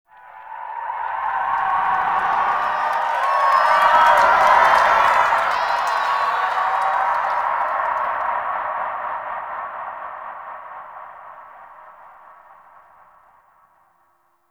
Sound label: Echoes, Ambience; Lunaro; Warframe; The New War; Zariman